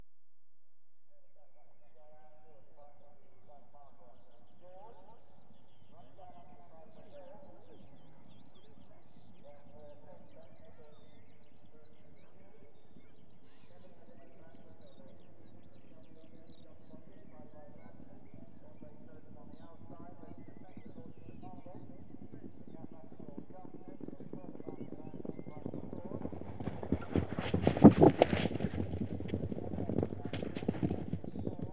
Point TO Point Racing, Field Pass, Equestrian Events; BBC Sound Effects Library